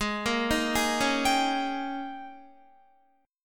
G sharp Major 7th Suspended 2nd Suspended 4th Guitar